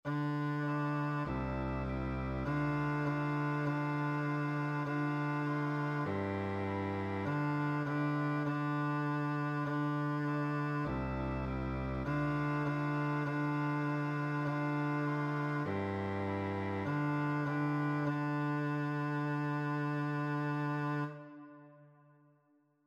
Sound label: CELLO; Dioses del Olimpo; 5º Antiguas Culturas, 5º Historia y Narraciones